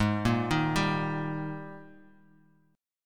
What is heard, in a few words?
G sharp Major 7th Suspended 2nd, Bass Standard; 16,13,13,12; JGuitar